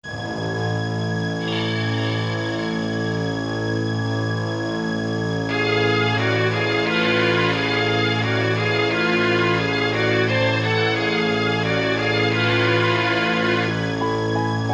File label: DARK NOISE